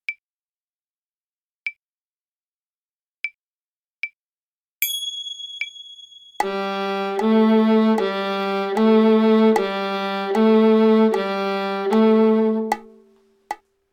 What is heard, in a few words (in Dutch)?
Papegaaienspel G snaar Altviool